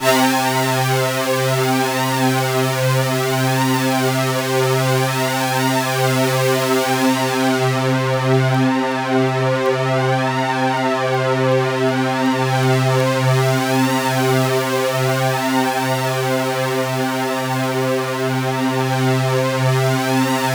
C3, Synth Line; 90 s Sample CDs; NiceBeats; SynthLine VOL-20

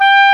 OBOE G4; WIND; AKAI S-Series CD-ROM Sound Library VOL-2

